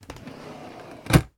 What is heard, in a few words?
household drawer wooden shut; sound effects one